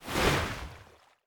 fishing dash

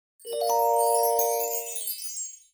clean finish

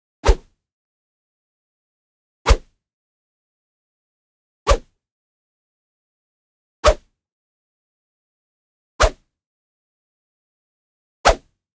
whoosh

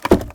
wooden big

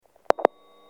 rope; Furniture

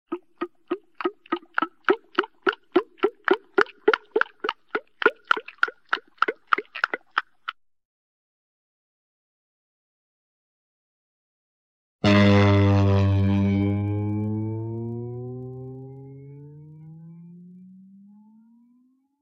water drop

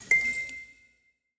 lamp light On

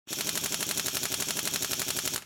demolition wrench

